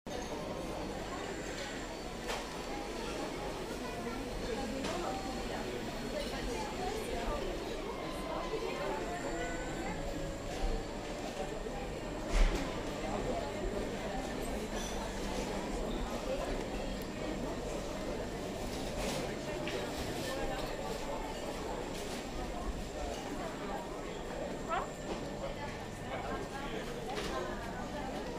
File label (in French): ambiance sonore arche